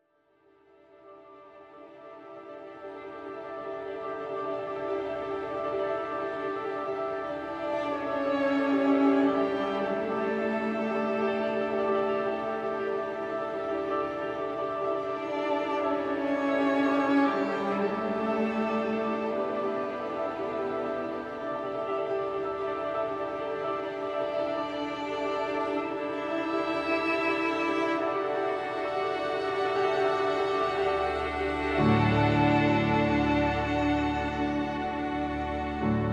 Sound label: End Music